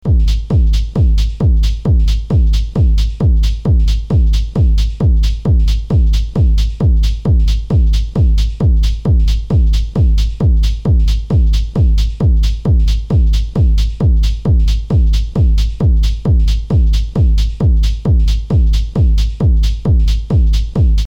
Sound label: Beat Loop